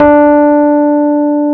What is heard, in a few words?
D 3, Electric Pianos; Wizoo